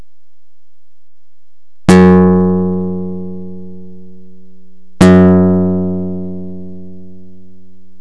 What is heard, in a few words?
KS filtered; DE2; Karplus Strong